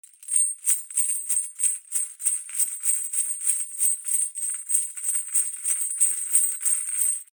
Chains Footsteps; Just Chains; Footsteps-AddOn; soundbits

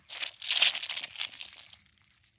Gore Blood Squeeze Drip; Just Gore 2; soundbits

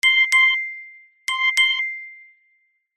mobile phone notification; zapsplat

